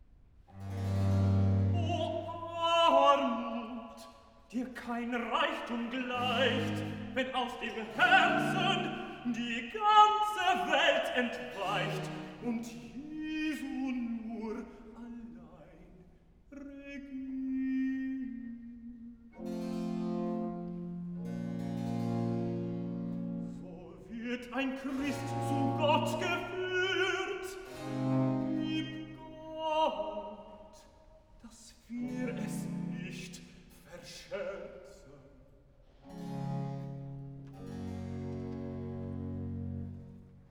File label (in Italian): Recitativo (Tenor); Cantata, BWV 75 'Die Elenden sollen essen'; Bach; London; 1st Sun After Trinity